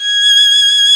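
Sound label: ARCO VIOL right, Solo Violin; E-MU Producer Series Vol. 2; More Studio Essentials; Composer; St. Arco Solo Violn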